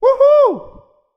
Funny Cheering Shout

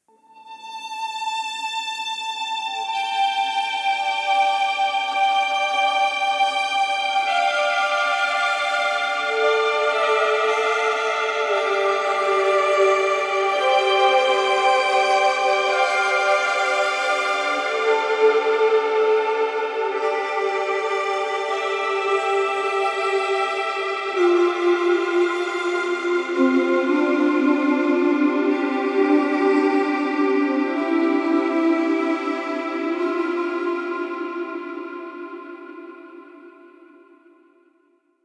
Psychedelic Violin Vision

